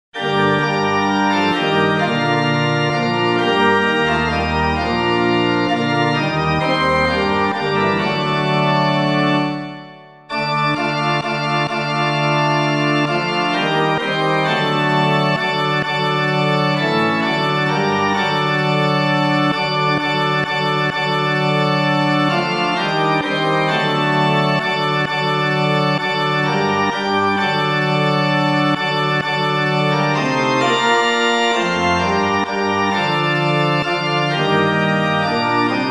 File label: When Jesus Comes to Jordan's Stream, organ